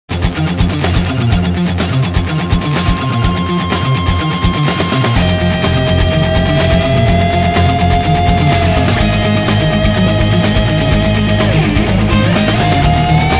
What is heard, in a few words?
Guitar Remix; Last Ninja 2; Central Park